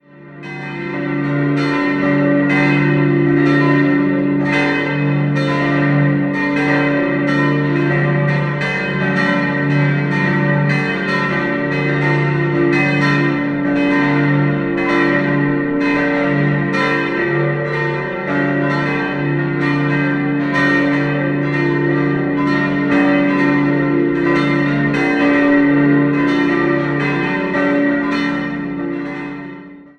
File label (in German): Gundelfingen, Glocken